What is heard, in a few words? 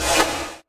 reload; Risk of Rain